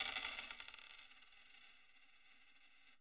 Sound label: coins countertop; angband